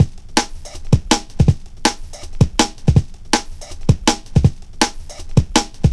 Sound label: 162 Conducted, breaks; m8 breaks